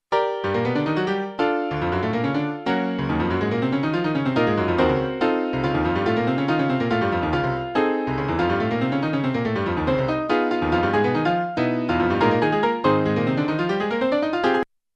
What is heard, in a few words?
Choral concerto orch